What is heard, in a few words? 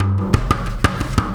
Tactile Impulse, Processed Drumkits